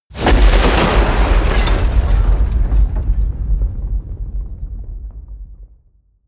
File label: detonation